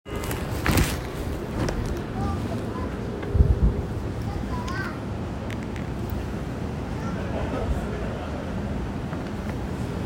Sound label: train sounds; 西武池袋線元加治西